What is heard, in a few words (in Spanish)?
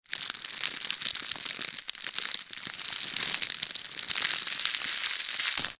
Crujido; Banco de Sonidos - Facultad de Art